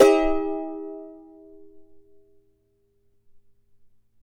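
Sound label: charango D minor down; Spectrasonics; Hans Zimmer Guitars VOL-2; CHARANGO CHD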